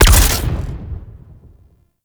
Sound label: Proj Explosion; Warframe; Sentients; Dolicholyst